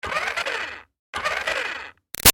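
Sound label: Toy Bounce Back Racer Close Up Revs Med, toy car; Toy Cars; Toys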